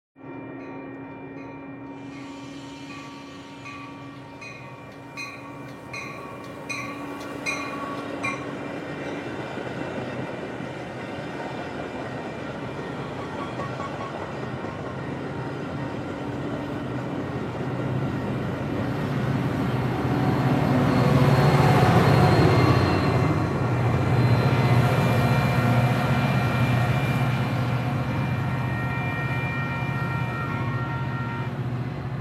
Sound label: train on tracks moving